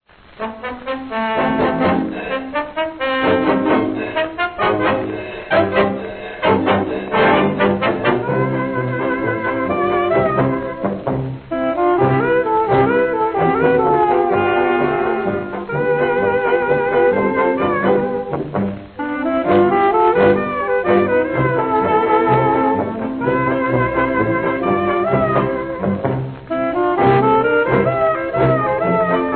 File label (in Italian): ORCHESTRA JAZZ SINFONICO; ANNO 1930